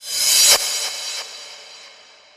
soft hitfinish; osu!

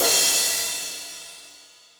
normal hit finish; osu!

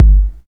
normal hit whistle; osu!; Uzura 39 s Mix Skin

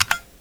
key confirm; osu!; skins! PRE RELEASE

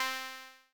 string, alerts; gnome

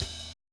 DnB Breakbeat Hit; osu!

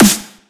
drum hitclap; osu!

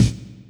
drum hit finish; osu!

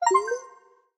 battery low; steam

